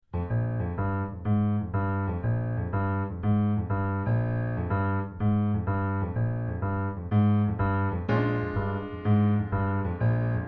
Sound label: western boogie